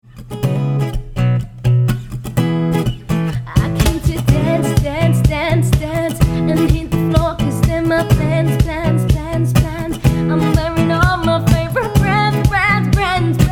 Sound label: Acoustic Rock Pop Band; Surrey